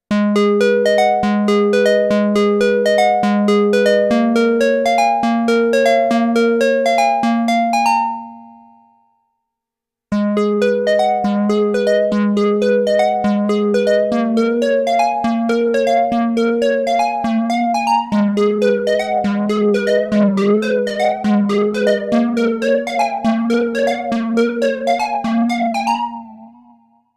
KORG Square Pluck (prologue); KORG Stator

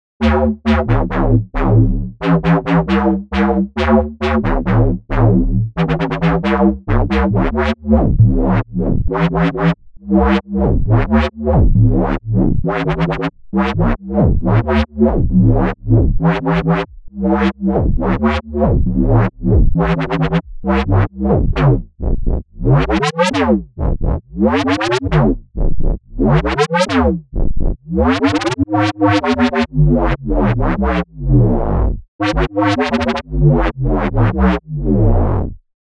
Synth Bass; Skew non-linear audio reverser

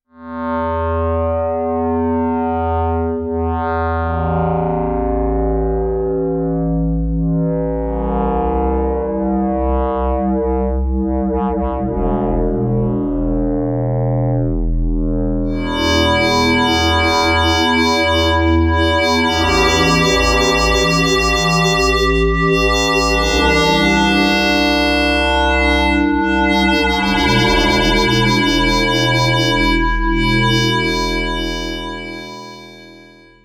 KORG Node Hollow Drone

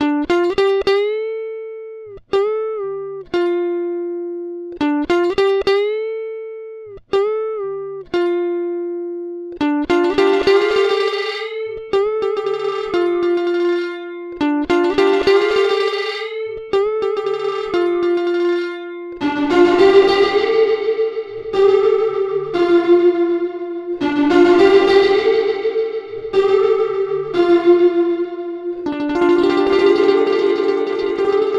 Electric Guitar; Dispersion

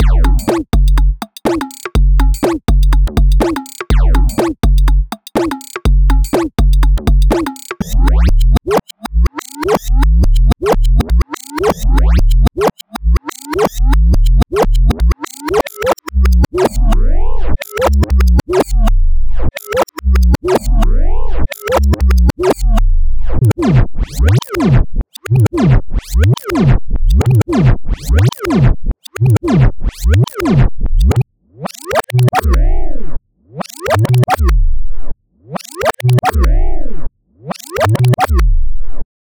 Electronic Break

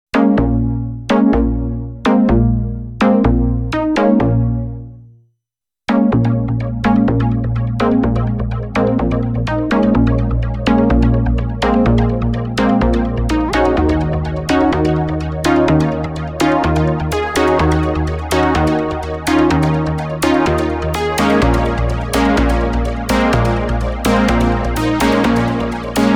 Synth Stab; KORG; KORG Isomer

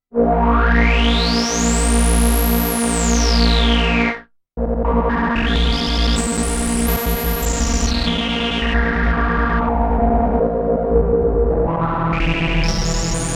Slow Sweep; Korg Rerun

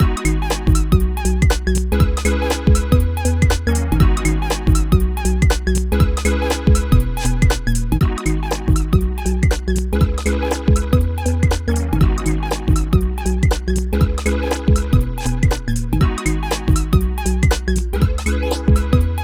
Stator - 2-step Garage Loop

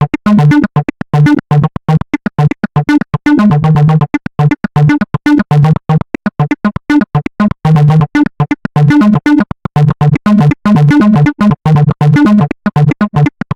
Analog Synth; Reshuffle

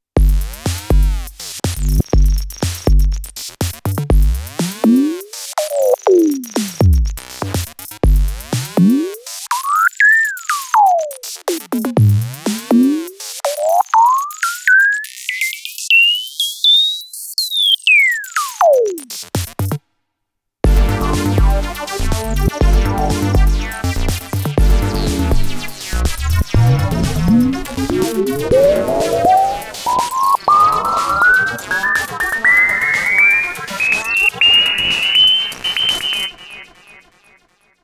Korg Drum Beat; Shift

